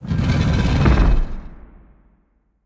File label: Moving pillar; Super Mombo Quest